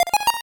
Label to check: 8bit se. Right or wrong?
Right